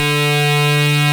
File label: RAVE BEEP; Best Service Alpha Dance vol.2